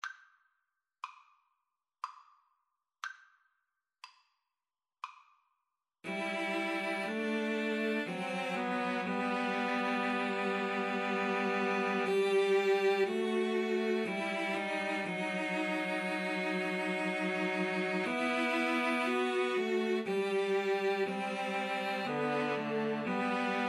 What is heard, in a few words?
Cello Trio